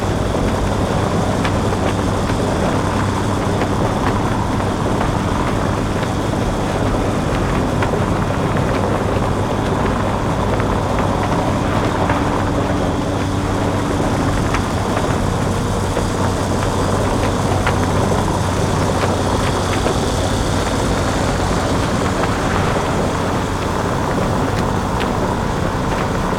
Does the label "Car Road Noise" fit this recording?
Yes